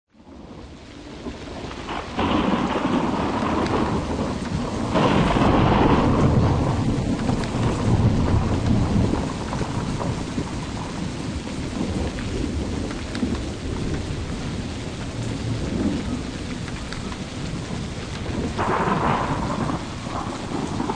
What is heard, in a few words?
Thunderstorm